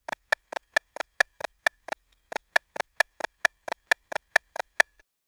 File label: display digits